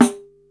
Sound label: pandiero, Yamaha Motif ES drum machine; 300 Drum Machines; Yamaha Motif ES-Series